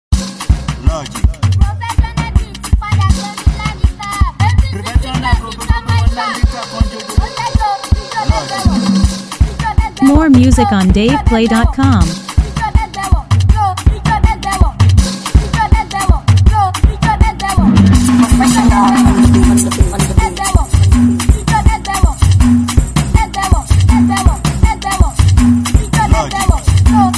Professional Beat voice

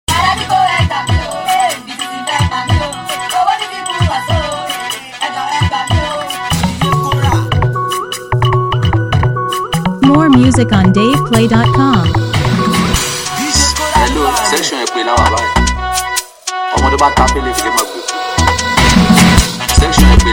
Cruise Beat

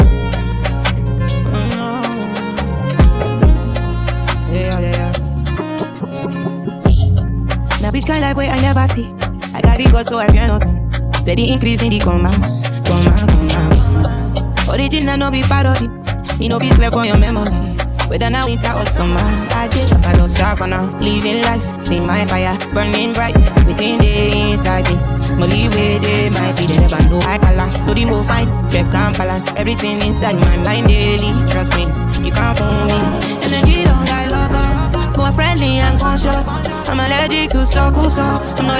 Drill

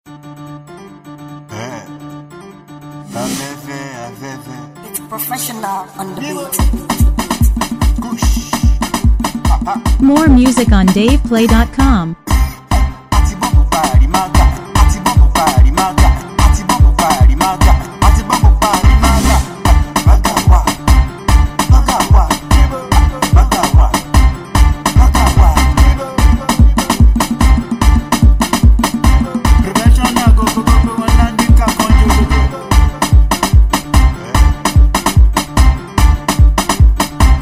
Beat

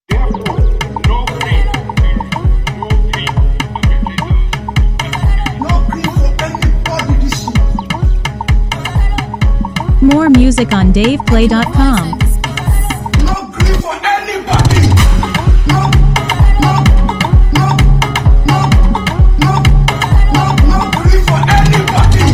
DRUMS

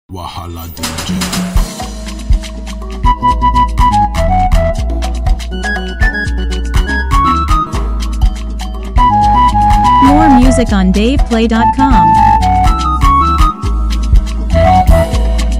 Flute Version

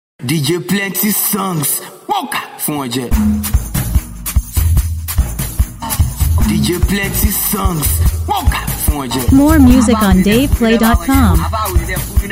Cruise Beat

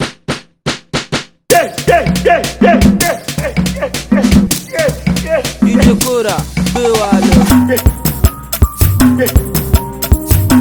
Beat

